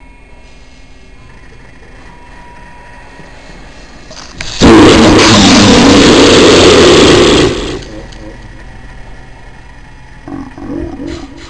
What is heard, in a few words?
hell voices